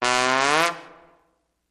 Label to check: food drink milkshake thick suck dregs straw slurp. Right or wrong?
Wrong